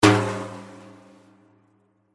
daf chap

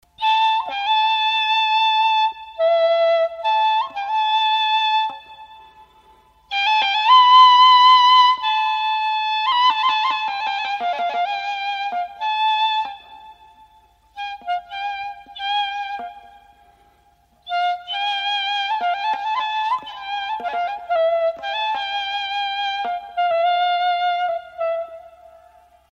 ney

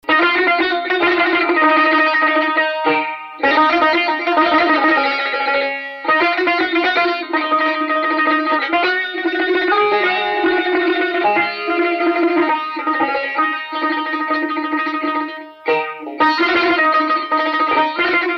daramad mahur

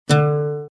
oud mi

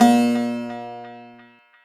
baglama si; baghlama

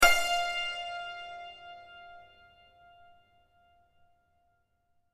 santor fa

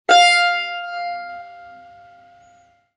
tar fa

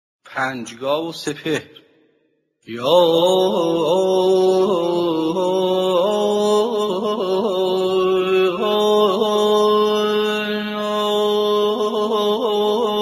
sepehr, rast panjghah

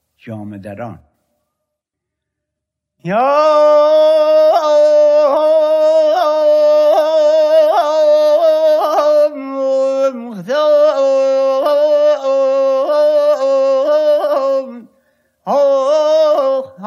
jamedaran, afshari

